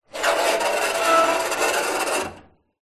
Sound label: foley metal scrape large loud